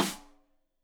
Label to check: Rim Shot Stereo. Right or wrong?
Right